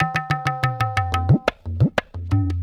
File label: Tabla